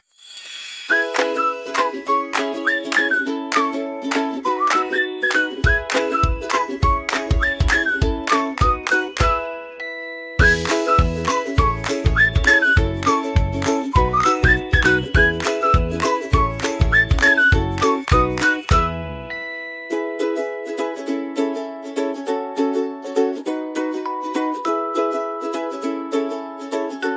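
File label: tiny adventures a playful childrens song; kids-and-fun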